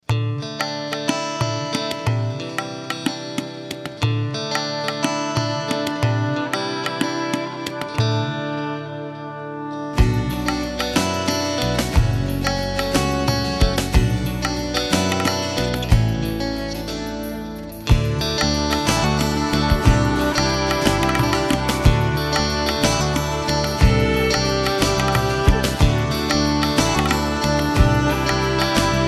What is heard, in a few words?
Melancholy In 6/8